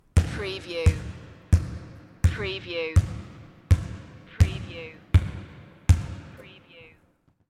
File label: Basket Ball Gym